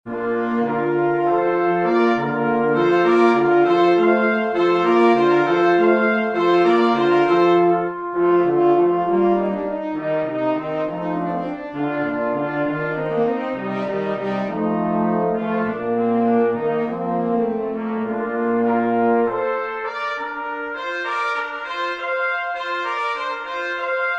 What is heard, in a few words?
watermusic quartet, brass; Water Music "Hornpipe" (2 trumpets, French horn, and trombone)